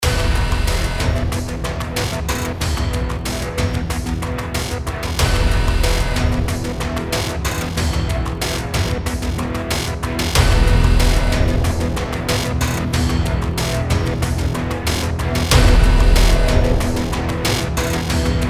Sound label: Ringtone Mysterious; TankiOnline